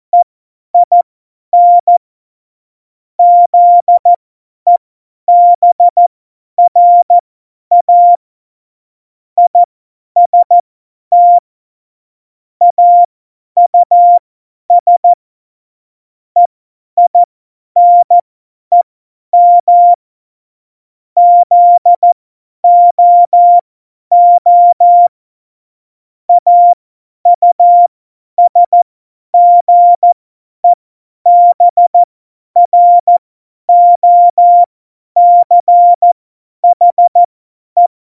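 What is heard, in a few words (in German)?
Witz, cw morsen; Morsekurs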